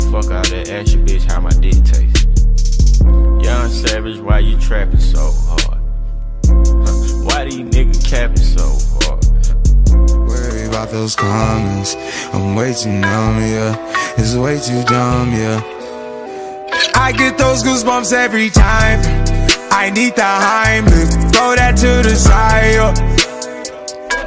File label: new hip hop ringtone